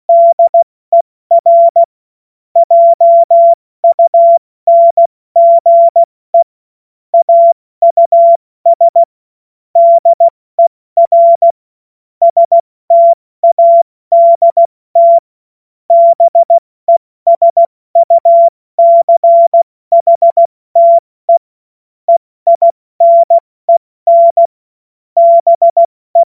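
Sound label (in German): Witz, Morsekurs, cw morsen; Witze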